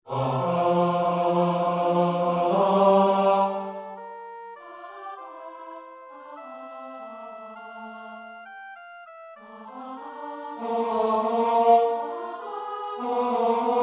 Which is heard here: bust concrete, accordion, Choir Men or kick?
Choir Men